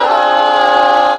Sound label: choir, Voice; Notes